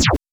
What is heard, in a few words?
laser; fysxasteroids